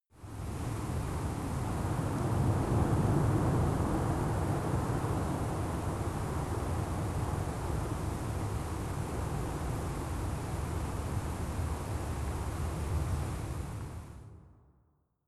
water fx windy

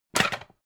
pan stack hard

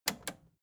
record player repeat click slow

